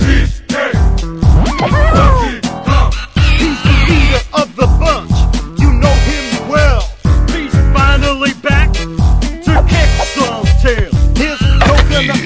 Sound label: Hip Hop